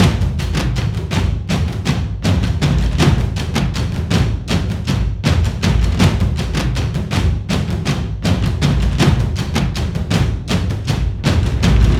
Drums; For Honor

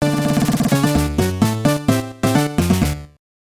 Battle Win; bomberman; turbografx 16

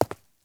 Cement Foot Step

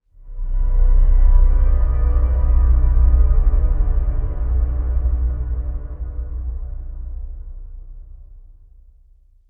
amb organ; gamerip; blackfaun

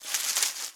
footstep foliage; Ice Age Scrat's Nutty Adventure

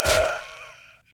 Death; I Am Weapon Revival; Snork

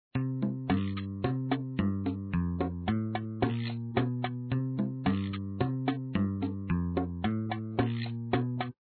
Boombox Loop; android ios movie online x68000 gamerip 2012; Papa's Hot Doggeria

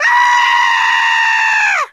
Scream Female; friday the 13th killer puzzle

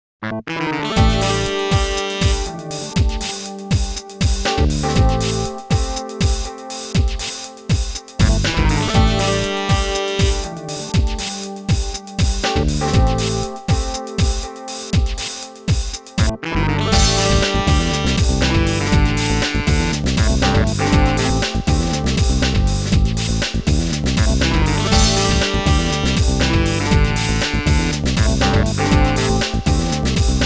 Stormy Saxophone; Iori Yagami; king of fighters neogeo s soundtrack 10th anniversary memorial box vol. 1

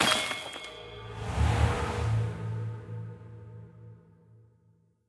Armour pieces; Level28 Blackburn

